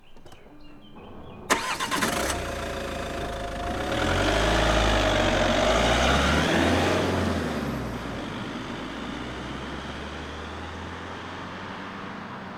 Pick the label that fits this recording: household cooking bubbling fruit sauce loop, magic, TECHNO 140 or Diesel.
Diesel